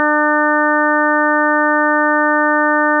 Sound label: 300 Hz to 6 harmonic partials, cumulatively